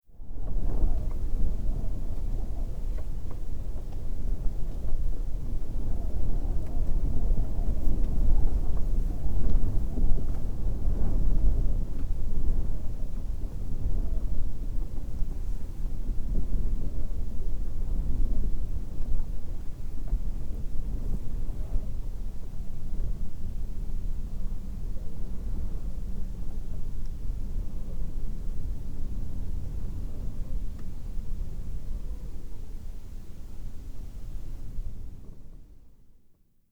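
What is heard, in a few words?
wind in GER Mongolia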